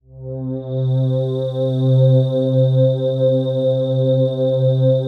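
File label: PAD, atmospheric pads; Best Service; XX Large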